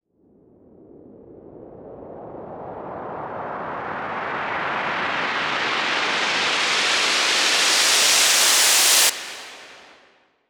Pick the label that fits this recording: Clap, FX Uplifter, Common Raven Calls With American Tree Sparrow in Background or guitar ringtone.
FX Uplifter